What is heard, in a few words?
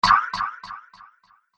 Laser X Bow With Delay; laser gun; sci-fi laser; Laser Gun Sound Effects